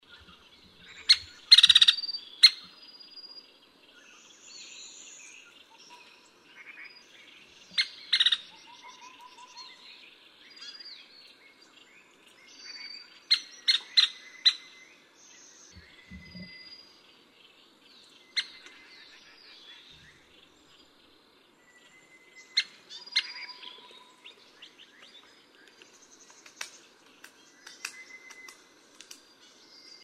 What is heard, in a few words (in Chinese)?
小啄木; 頭啄木鳥; 啄木鳥科/留鳥